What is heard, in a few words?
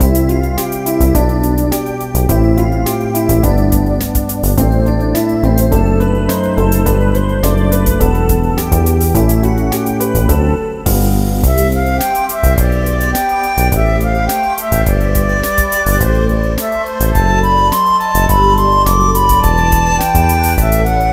BGM Test; Kangaeru Chikara o Gungun Nobasu DS Youji no Nou Tore; nds